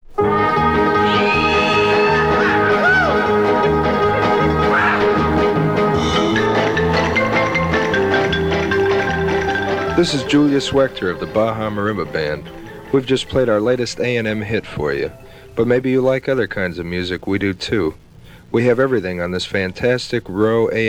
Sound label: Marimba Band